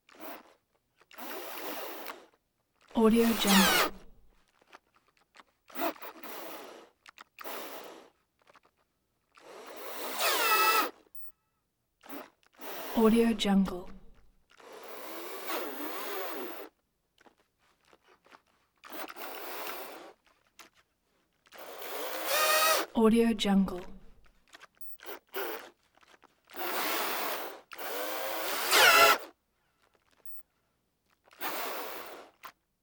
servo labored bursts grind struggle slow

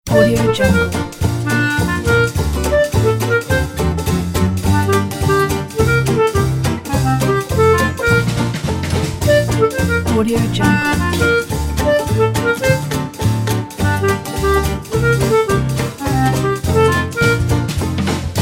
funny rainbow

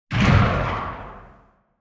gun tails; soundmorph

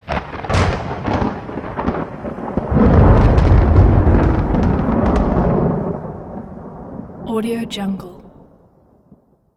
lightning strike

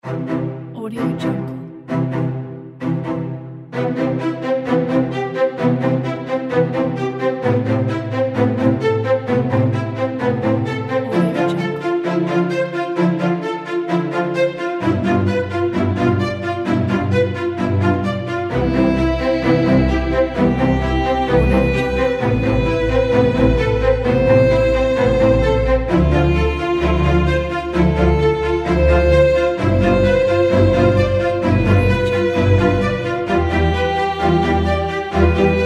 classic inspirational orchestral

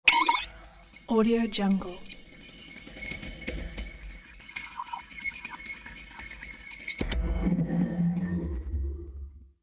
frozen resonant rod tone

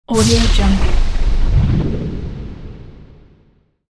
space explosion or laser blast; sound-ideas

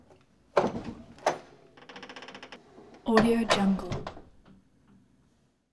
fire door open lever clack; prosoundfx

